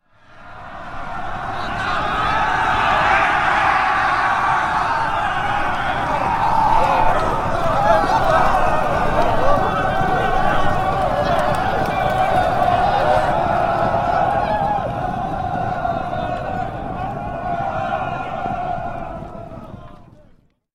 Infantry Charge 1000 Men; Historical; Hollywood Edge Historical Series; War Horses Armies & Weapons

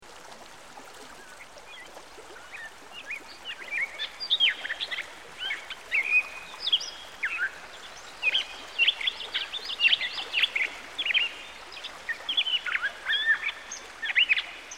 Gray Catbird; Dan Gibson; Songbirds By The Stream

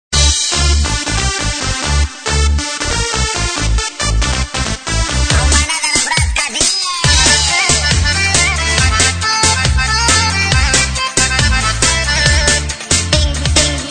Funny Remix Ringtone

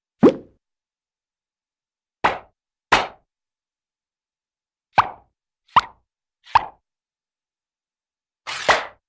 Bubble-Balloon Pop; Hollywood Edge Cartoon Trax

